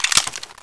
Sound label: 9mm clip out, weapons